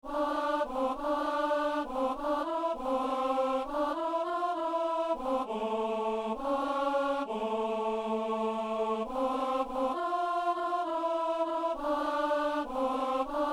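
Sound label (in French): ténor; messe coutrillonne; sanctus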